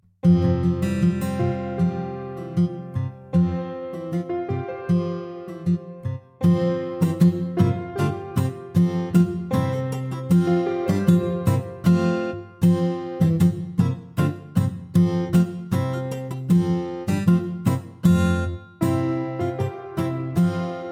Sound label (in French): Chansons de Noel et Chants de Noel; NAVIDAD FRANCIA